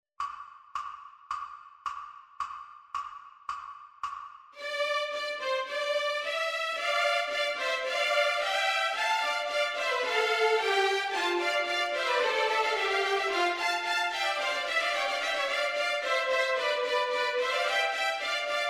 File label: Backing Track; Capriccio II; Vierdank; ViolinSchool